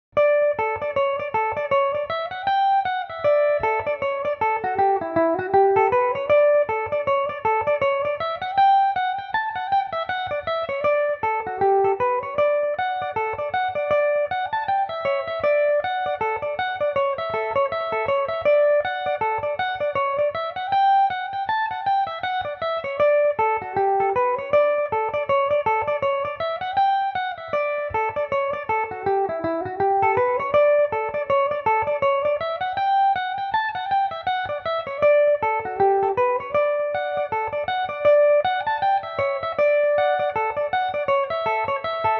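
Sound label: D major, Irish mandolin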